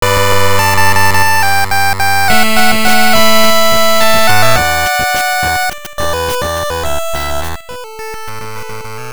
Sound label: Game Over (Complete); Dance Dance Revolution GB2